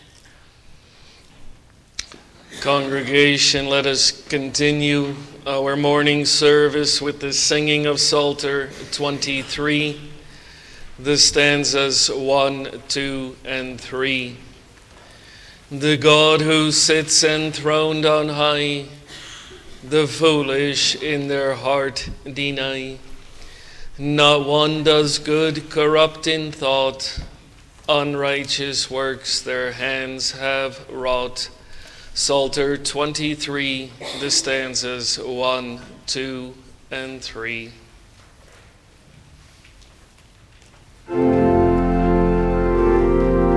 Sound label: Singing; passion; Our Misery and God's Remedy